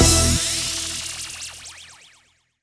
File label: win big end snd; netent